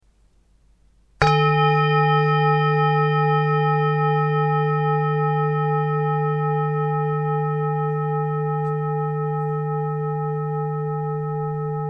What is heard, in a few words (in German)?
Klangschale